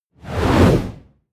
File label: whoosh